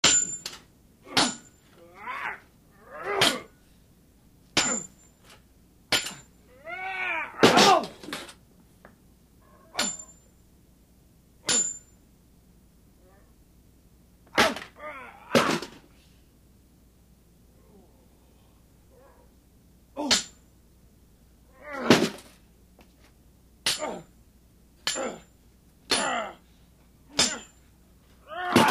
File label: Two people sword fighting; cold weapons